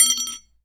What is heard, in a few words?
Aluminium Tube Drop